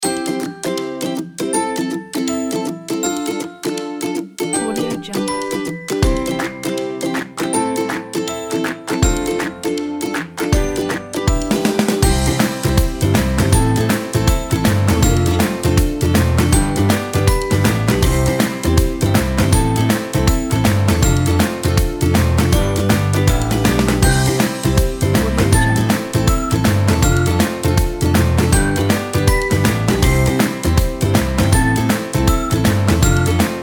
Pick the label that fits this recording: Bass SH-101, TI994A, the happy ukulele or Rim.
the happy ukulele